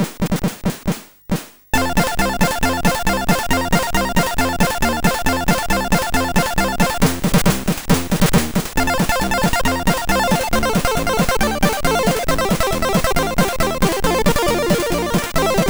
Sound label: MOS6581R4